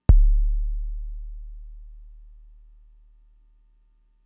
Kick, Drum Machines Fricke MFB-522

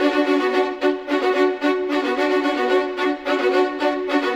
Violins, Orchestral; Sonic Foundry (Sony Creative Software); Orchestral VOL-2; Modern 10

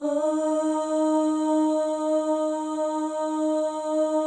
FEM OHS, Symphony of Voices; Spectrasonics

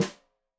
Snare Stereo, Drums; Deeply Sampled Drums

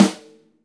ST AMB SN, STEREO AMB SNARE

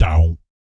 BASS FX, Vocal Planet, Jazz FX; Spectrasonics

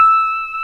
PEDAL STEEL

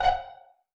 PIZZ F#3 PP, Orchestra, STR PIZZ; Sound On Sound - Peter Siedleczek's Orchestra

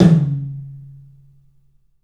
TOM, 3D Drums, CLUB TOM; 3D Sound

